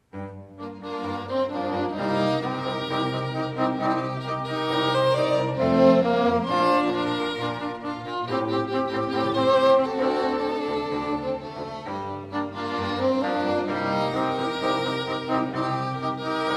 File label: String Quartet; Classical